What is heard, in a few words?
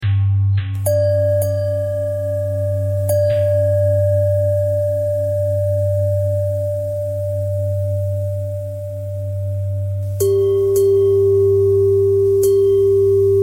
bell bend threads